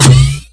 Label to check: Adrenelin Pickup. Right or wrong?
Right